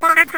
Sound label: Voice Dog; Princess & Conquest v0.20.06